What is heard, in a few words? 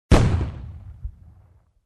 Cannon Blast near